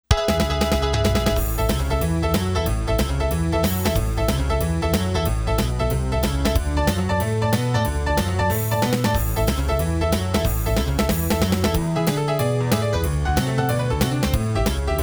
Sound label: Synth Version; Super Famicom Magazine Volume 21; New Game Sound Museum; Hula-Hoop